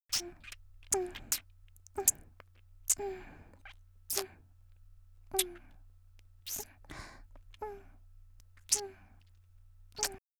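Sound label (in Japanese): 少女「キス」静か, 元気な少女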